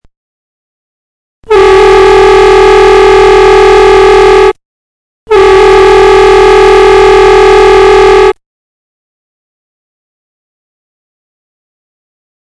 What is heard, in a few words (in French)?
trompes